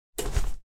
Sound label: Cloth movment